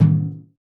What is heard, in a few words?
Dofs Doom, Roland BK-5 Percussion